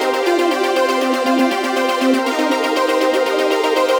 (arp); Sonic Foundry (Sony Creative Software); Ugly Remnants VOL-2

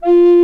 HRP RCDR&FLT

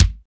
KIK SOLID, Dance Kicks; Roland L-CD701; Dance K1

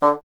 WND BSN, Bassoon; Miroslav Vitous - Solo Instruments VOL-1

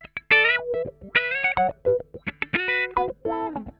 LICK, Funk Guitar; 90 s Sample CDs; Zero G

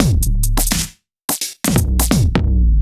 Kit Heavy Swing, 85bpm; off the grid

